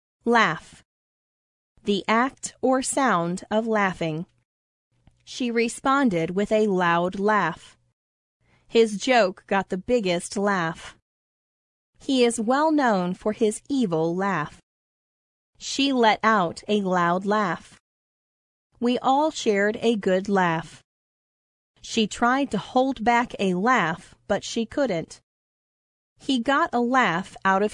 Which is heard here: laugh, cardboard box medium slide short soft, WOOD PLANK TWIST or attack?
laugh